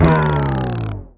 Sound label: power down; halloween